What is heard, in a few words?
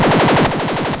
monodelay f - 144; loop